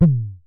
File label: Synare Filter; Star Instruments Synare 3